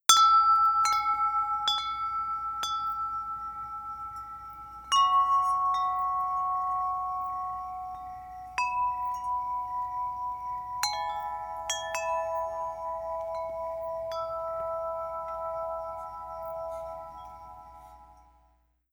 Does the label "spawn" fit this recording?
No